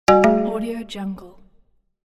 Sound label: system notification